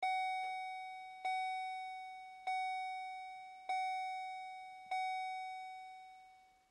Truck GMC Savana Chime Keys In; Trucks; Vehicles; Big Room Sound

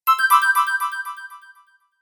Bright Fantasy Spell, magic; Magic Sound Effects

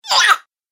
Night Gremlin, Monster; horror; Monster Sound Effects

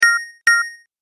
Double Click Sound; object sound effects

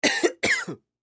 Human Male Cough; Sick Sound Effects; Sick (Sound Effects:Human)

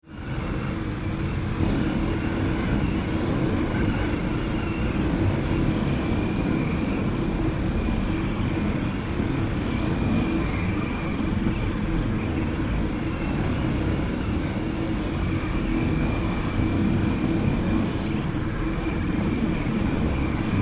Ambience Ventilation Shaft Industry; Factory Sound Effects; Factories (Sound Effects:Backgrounds); SFX Bible 6

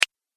Pantomime Finger Click; Cartoon Sound Design

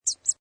Bats Single Bat Squeak; Animals

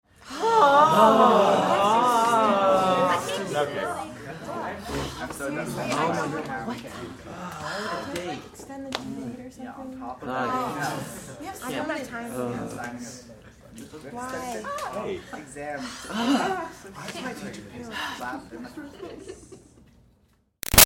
Crowd High School Class Awwh Complaining; Schools (Sound Effects:Backgrounds); Big Room Sound

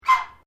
Bird Goose Squwark, Japanese Geese; Geese (Sound Effects:Animals)

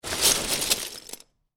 Lego Grab Handful Of Lego From Lego Box; Miscellaneous Toy Sound Effects; LynneMusic

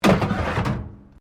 METAL BIN IMPACT PLASTIC CRASH BARRIER

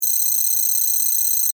Data Readout Beeps, Scifi; Tech; BlastWave

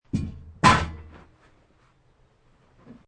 Metal Tool Bang Wood, Metal tool bangs on wood.; Objects

